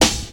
present acoustic snare sample b key; snare drum samples

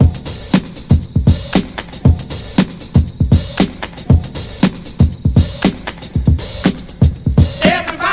118 bpm hq breakbeat e key

